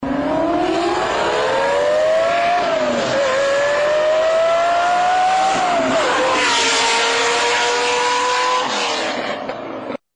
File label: Lambo Accelerating